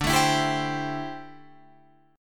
D 7th Suspended 2nd Suspended 4th D, Guitar Drop D